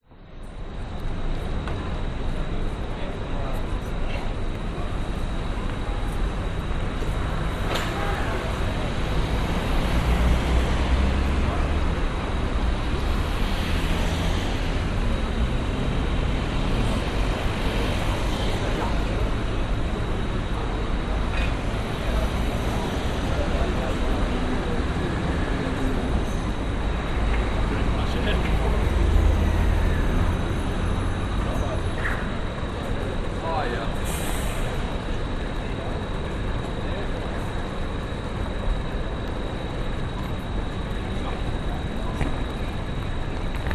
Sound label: public places city street junction pedestrians traffic night; albert st charlotte st